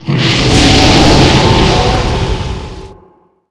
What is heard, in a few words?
breathe, DRAGON; Fate The Cursed King; MONSTERS